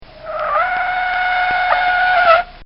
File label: Audi Braking Hard; Cars; Vehicles